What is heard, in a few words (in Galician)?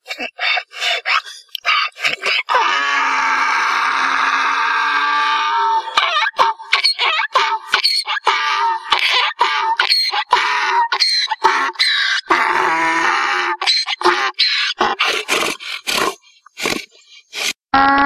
son burro; animais